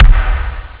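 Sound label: bass drum; Amiga Soundtracker Sample Packs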